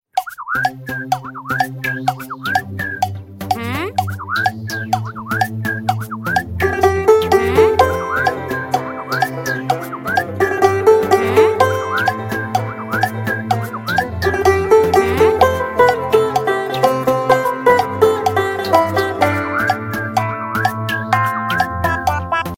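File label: instrumental ringtone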